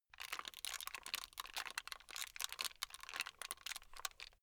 plastic rattle slow

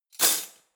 cutlery spoons drawer place hard

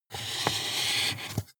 marker mark soft

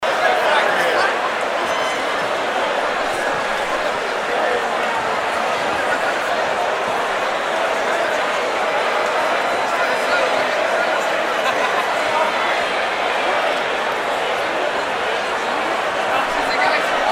octoberfest beer tent

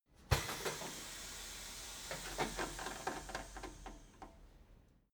tire changing machine hydraulic arm separator out

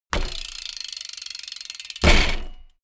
bmx bike ramp fly land

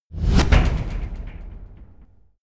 metal decay

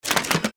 weapon noise

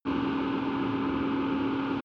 windy dark shuttle

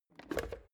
plastic water boiler lid off

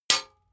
sword metal hit ring clank

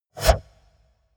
wipe

